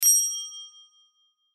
Bell Store Clerk, Bell Store Clerk Hotel Ring Ding; Foley; Blastwave